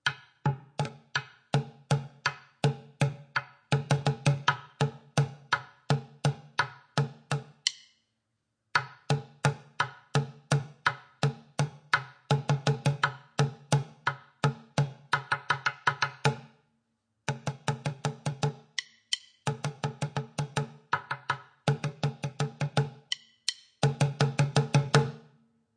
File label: Drumming; Level 7